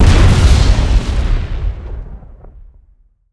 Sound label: Shell Fragment Explode